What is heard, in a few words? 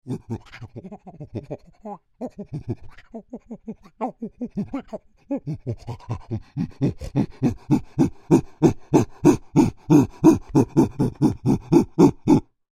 Creature, Human Imitates Monkey, Grunts, Low; Monkeys; BigRoomSound